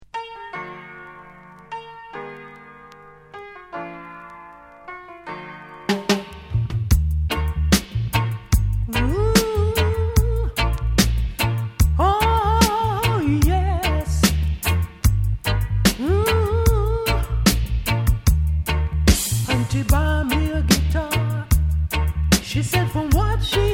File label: Guitar Guitar Dub